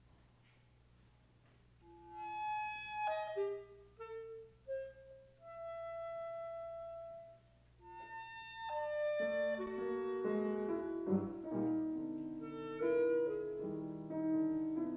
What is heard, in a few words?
Four Pieces for Clarinet and Pian